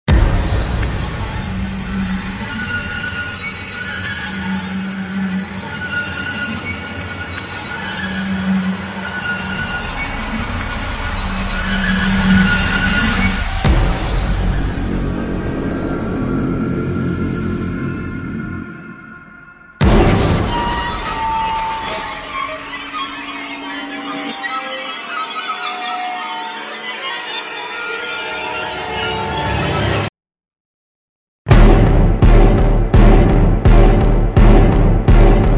D String Nightmare

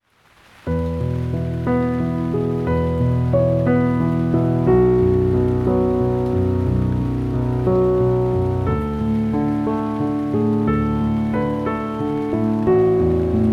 Piano, Thinking Music (With Rain)

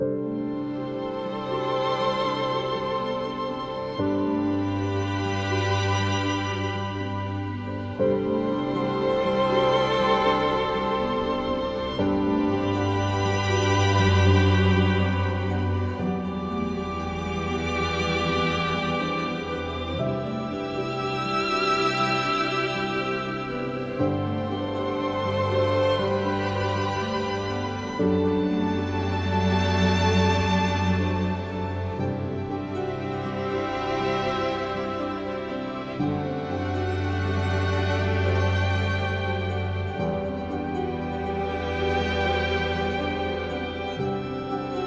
Attachment Ambient

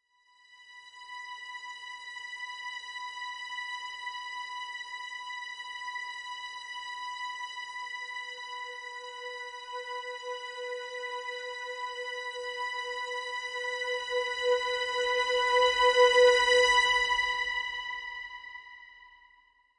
Simple String; Brand X Music; Brand X Music Catalogue - Editor's Essentials

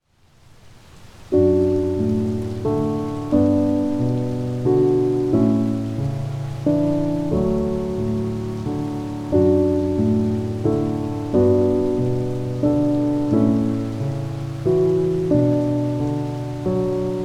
Calming Piano Music with Rain

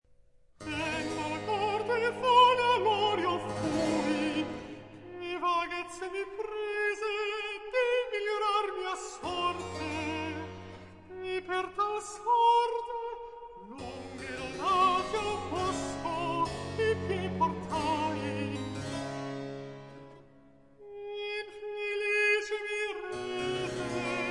Cantata for Alto solo, Recitati; Recitativo; Antonio Lucio Vivaldi; Care selve amici prati; RV 671

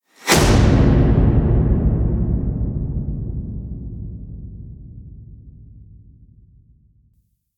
Sharp Shock; Gothic Storm Music; Brain Warp (Sound Design Trailer Tools)

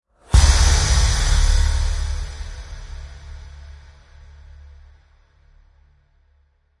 Impacts Horn; Gothic Storm Music; Advanced Sound Design 2012

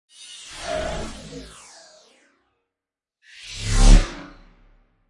Storms Meteor

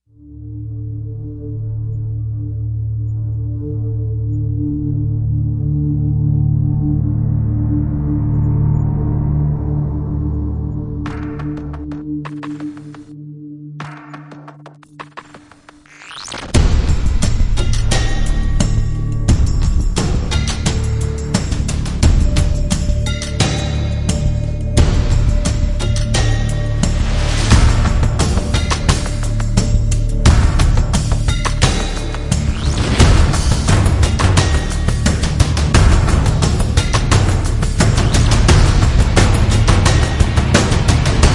Deralict (Percussion Only); Ninja Tracks

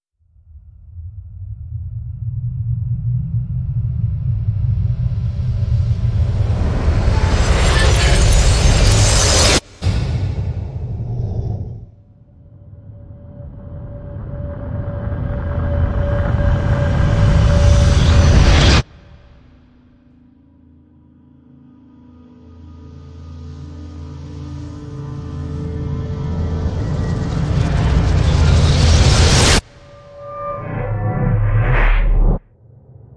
Deep Implosion; Epic Textures vol. 2

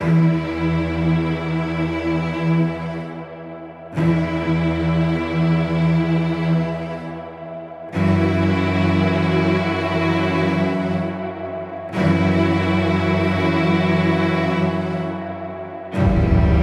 Outrage Ambient

